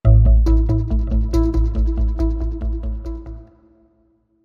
Sting

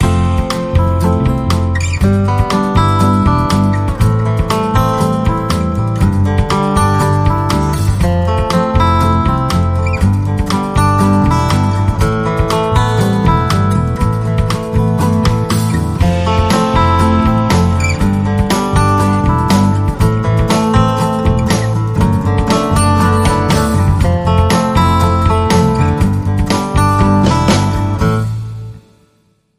No Accordion

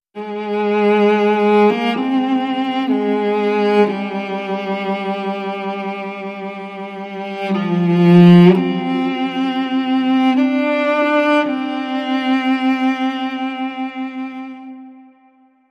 Cello

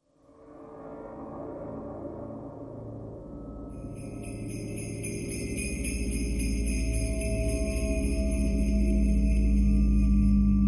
FX Drones Only

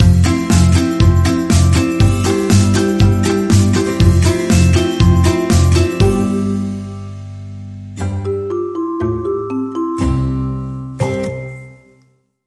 Sneaky Jumpers, Sting Upbeat

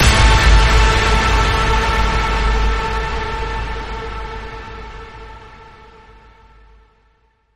Ping Sparks Fly

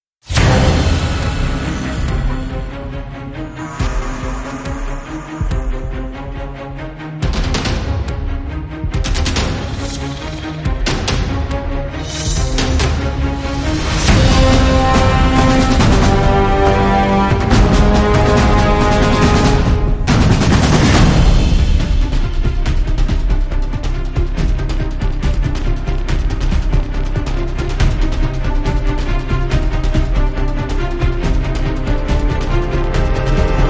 No Guitars

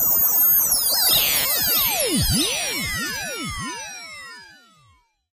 Warp Field

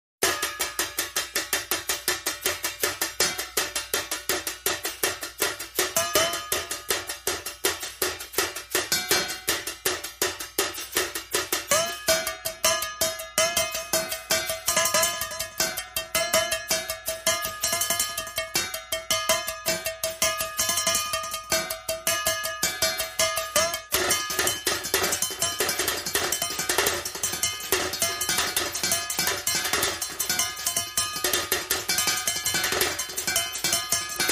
High Drums

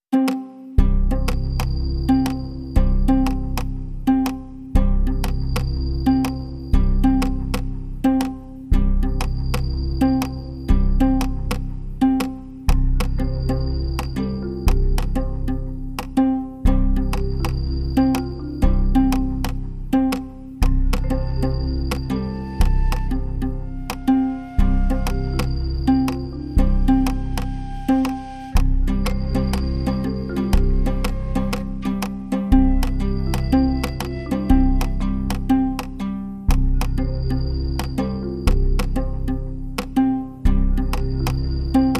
No Percussion

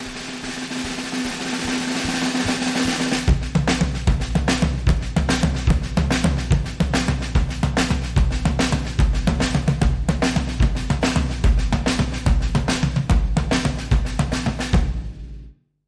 Breaker Action D Drums Only

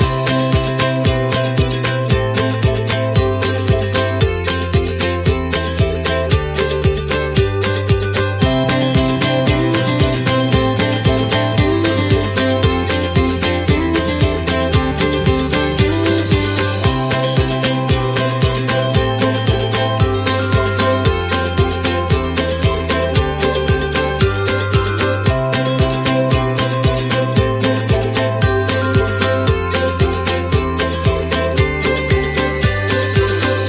Upbeat Acoustic Christmas, Loop

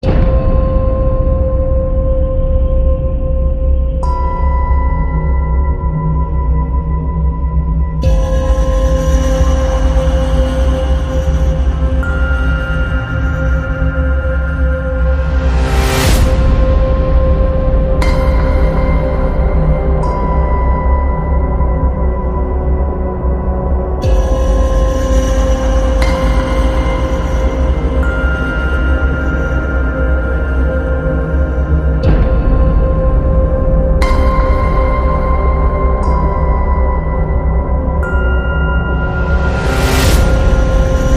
No Strings

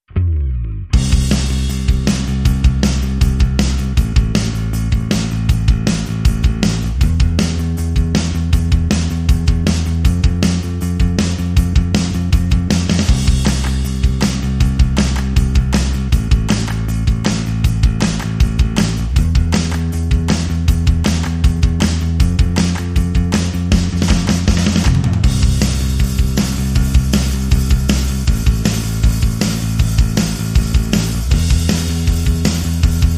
Drums and Bass Only

